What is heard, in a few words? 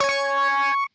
Race Count Start; Timberman VS; ps4